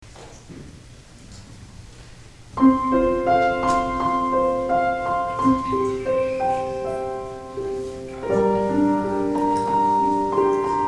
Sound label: Piano Meditations